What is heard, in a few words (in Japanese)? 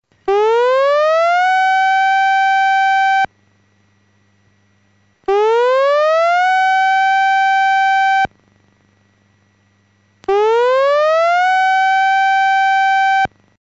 ootsunami keihou sairen; 大津波警報サイレン音; デジタル防災行政無線